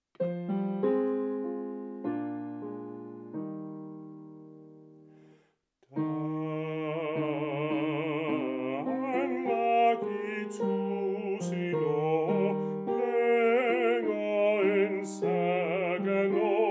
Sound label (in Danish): hymne bas